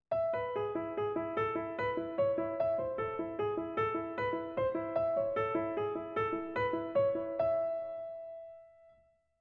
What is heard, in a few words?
motives; 10 - E Major 3/8